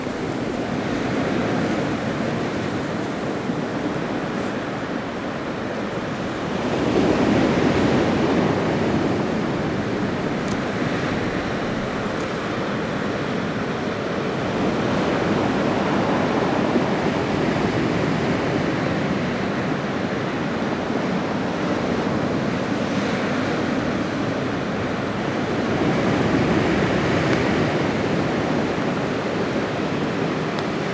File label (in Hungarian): padon ulve viharos ocean